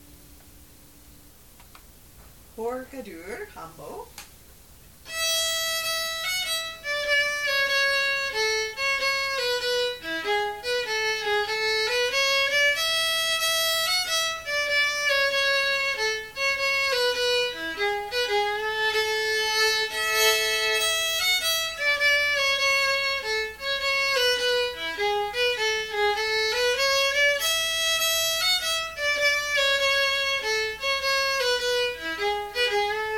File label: Harga Dur